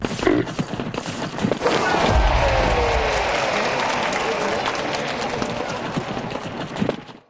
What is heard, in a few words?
GAMBLE WIN; Corrida Romance; Wazdan